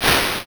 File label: swoosh